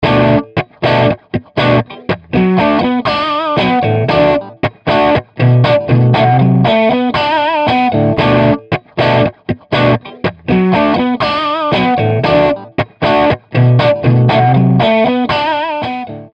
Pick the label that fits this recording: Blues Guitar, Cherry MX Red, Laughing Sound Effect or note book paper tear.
Blues Guitar